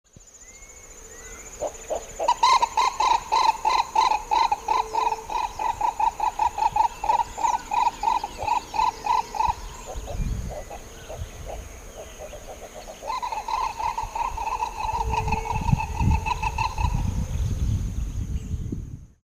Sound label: Mesembrinibis cayennensis